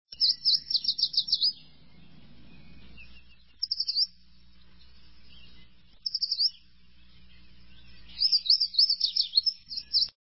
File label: Yellow Warbler